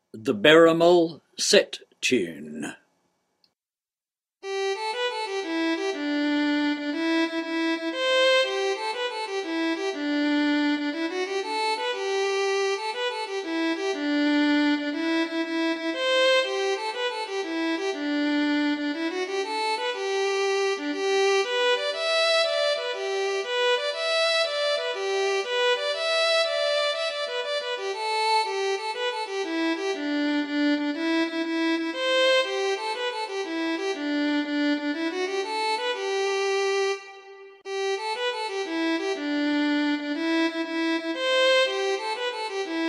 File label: set tune 120 bpm